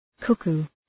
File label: cuckoo